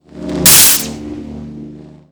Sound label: Lightning Flash